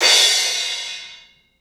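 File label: CLUB CYMBALS; 3D Sound; 3D Drums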